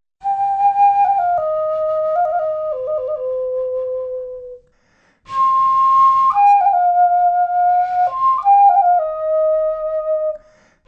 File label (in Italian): flauto nasale